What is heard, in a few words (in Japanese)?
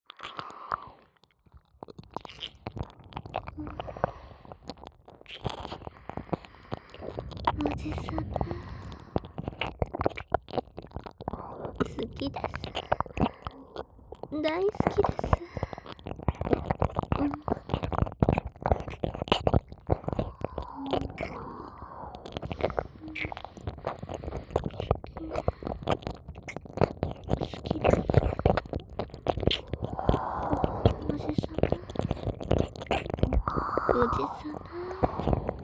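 しゅきしゅき両耳舐めループ; ASMR; 耳塞ぎなし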